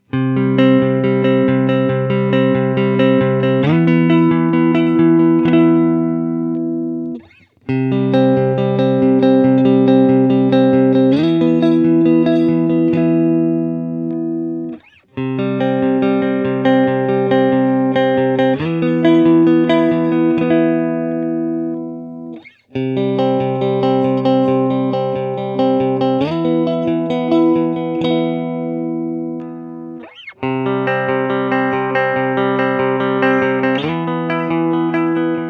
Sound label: Picking Clean, strat pickups; CCS-1